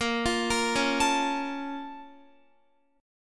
A sharp Minor Major 7th, down, Guitar; A#mM7 arpeggiated

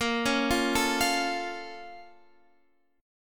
Bb Minor Major 7th; BbmM7 arpeggiated

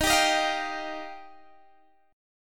Eb Major Add 9th, Guitar Open G

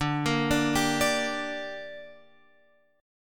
D Suspended 4th Sharp 5th D, down, Guitar Open F; arpeggiated

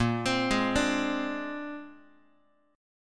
A sharp 9th A sharp Guitar; arpeggiated; JGuitar

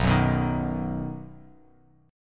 B Major B down

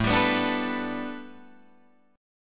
A 9th Suspended 4th Guitar Drop D, chord

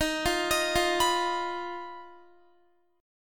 D sharp Suspended 2nd Sharp 5th, Guitar; arpeggiated; x,18,17,20,17,19; 4F,4A,5C,5G,5C,6E; chord

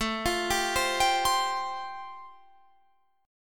C Suspended 4th A; Csus4/A arpeggiated

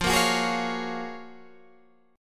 F sharp Major 9th

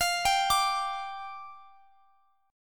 G 5th F, chord; G5/F arpeggiated

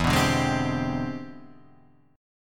E 7th Flat 13th Sharp 9th E, guitar; strummed

